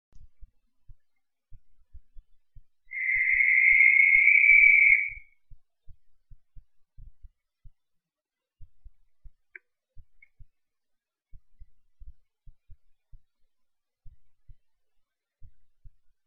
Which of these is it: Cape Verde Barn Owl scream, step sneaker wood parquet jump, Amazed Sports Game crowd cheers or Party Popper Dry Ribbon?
Cape Verde Barn Owl scream